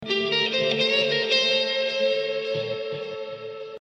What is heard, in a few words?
Guitar